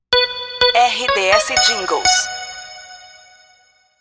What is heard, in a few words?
theme traffic id; Pacote Rádio Aratiba FM, Aratiba/RS